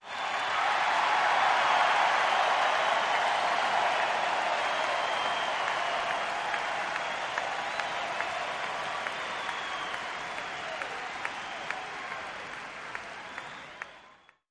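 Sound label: Crowd 50000 Whistle, large int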